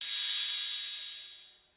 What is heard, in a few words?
Crash; Cymatics; Light Free Sample Pack; Drum One Shots